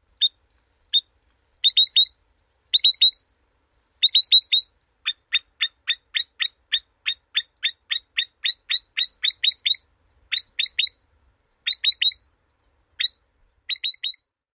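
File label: alarm calls near nest, Spotted Sandpiper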